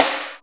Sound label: Snare, Akai MPC500